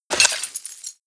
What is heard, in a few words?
debris pottery, bullet impact debris